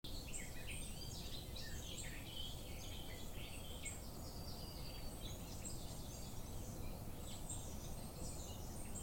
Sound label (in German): gartengrasmuecke